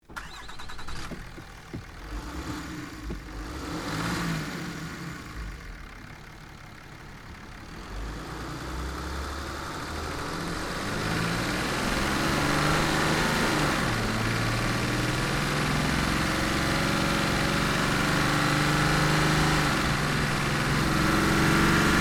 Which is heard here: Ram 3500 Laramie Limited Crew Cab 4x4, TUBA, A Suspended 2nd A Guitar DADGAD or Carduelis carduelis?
Ram 3500 Laramie Limited Crew Cab 4x4